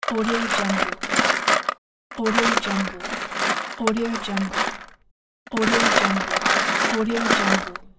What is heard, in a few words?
digging ice cubes